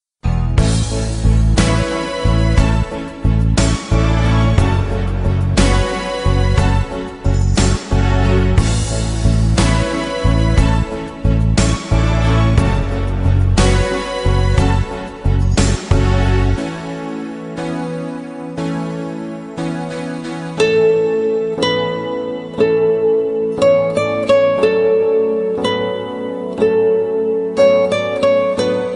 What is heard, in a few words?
Guitar Sensation